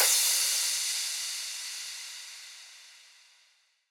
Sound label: Crash; Crashes